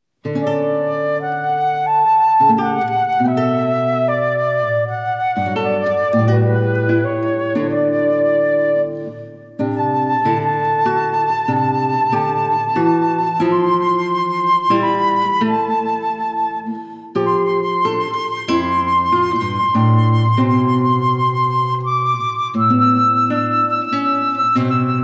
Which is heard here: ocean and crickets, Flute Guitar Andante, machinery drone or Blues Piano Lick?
Flute Guitar Andante